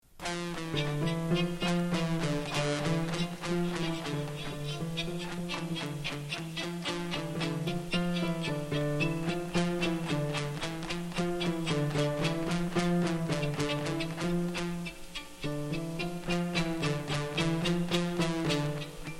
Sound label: silence changes perception of melody